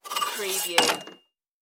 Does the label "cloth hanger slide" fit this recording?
Yes